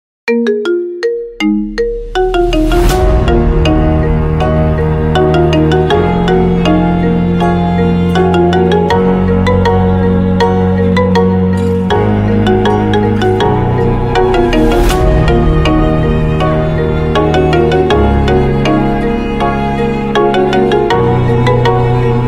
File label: marimba ringtone bgm; solo leveling